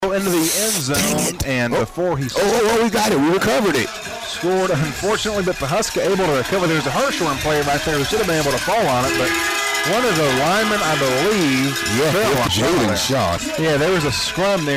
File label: Game Clip; Hartshorne; Vann Wildcat fumbled the ball into the end zone, but one of his teammates was there to recover the loose ball.; Huskies; Sports